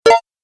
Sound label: Message Alert, beep